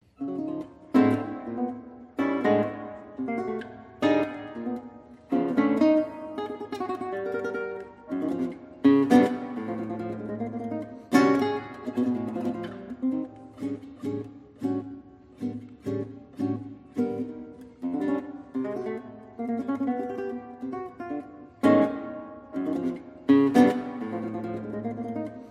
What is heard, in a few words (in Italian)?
deciso